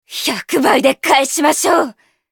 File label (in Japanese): ダウン; ナタリー